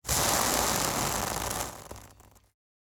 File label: dry ice sizzle - serrated hot knife blade pressed against block of dry ice